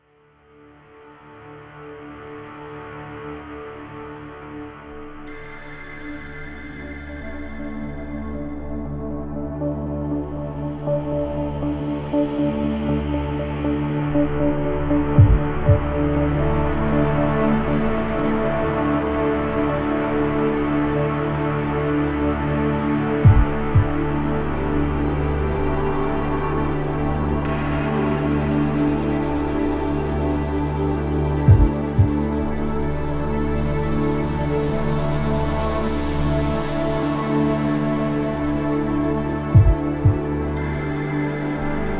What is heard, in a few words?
Ambient; Acura; NSX